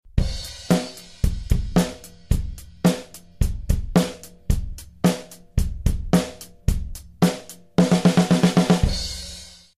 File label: beat